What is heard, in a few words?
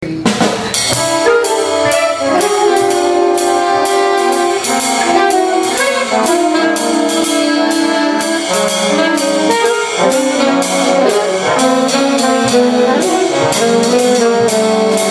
slapstick, bigband